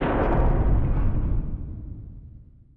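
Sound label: Collision Interior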